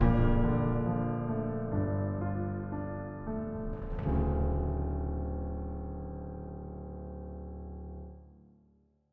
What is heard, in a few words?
Zoom In Music; Chock